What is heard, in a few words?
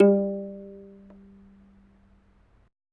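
African HARP G 4; AFR. HARP1; Heart of Africa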